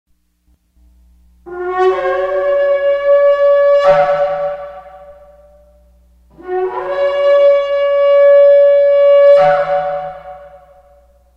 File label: shofar track